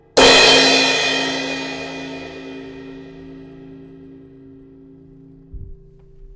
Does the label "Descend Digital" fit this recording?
No